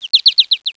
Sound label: bird